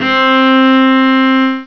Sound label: Cello C4; Prince of Persia